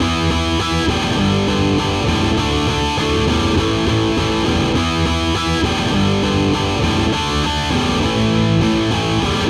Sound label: 101 Indierock Guitar Dmin; Pro Tools Essential Demos; Essential Loop Demos; Demo Session - Rock Hard; Digidesign